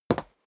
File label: step sneaker wood parquet single right; schritte